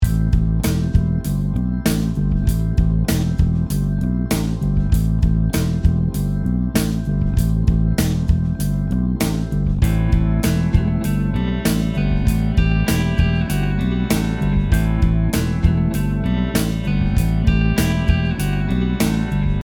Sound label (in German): Rock Bass Pentatonik; rockige Bass Riffs